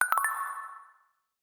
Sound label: notifier plickle, notifications; Mozilla Boot2Gecko